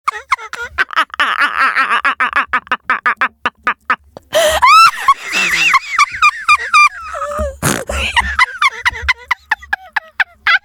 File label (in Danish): Snorkelatteren